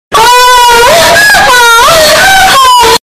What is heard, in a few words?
moan sound effect; ringtones